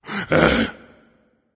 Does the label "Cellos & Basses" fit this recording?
No